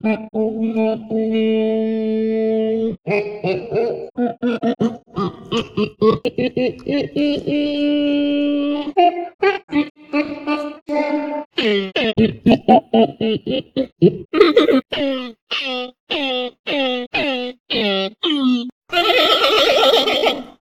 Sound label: Giant Panda Audio Sample; Fu Bao